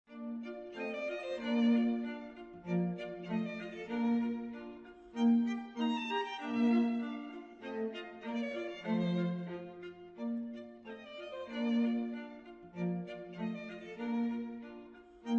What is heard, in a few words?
violin concerto; Movt 2